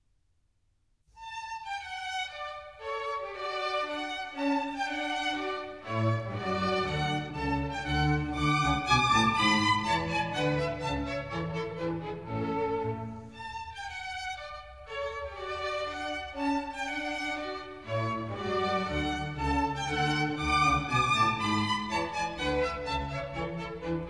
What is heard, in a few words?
Minuet for Orchestra in D; Wolfgang Amadeus Mozart; Dances and Marches